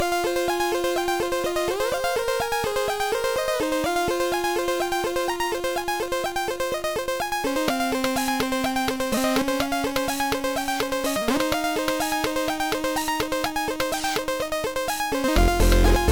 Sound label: chiptune; Trackers; Modland